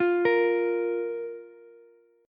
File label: Bb 5th Bb Ukulele; Bb5 arpeggiated